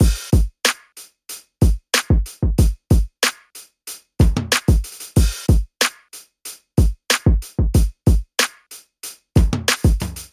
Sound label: 93 bpm drum beat G sharp key, kick tuned to the G# note; drum loops